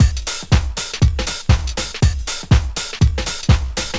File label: MOON LOOP, Techno Trance